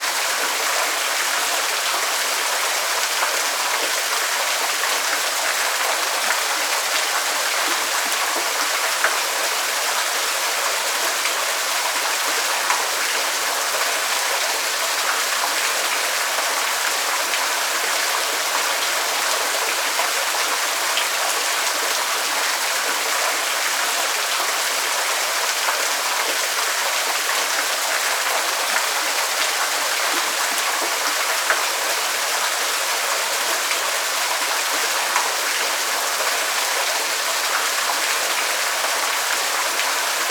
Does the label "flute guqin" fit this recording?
No